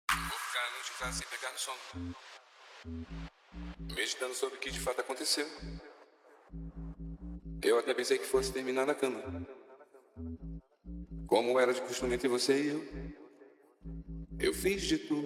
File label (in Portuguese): mega funk